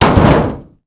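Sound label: debris metal medium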